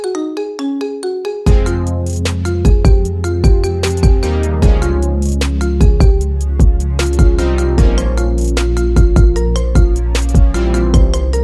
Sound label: Marimba Remix